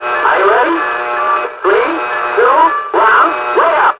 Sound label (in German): Alarm bei kritischem Batteriestand; Windows-Sounds; Win-Sounds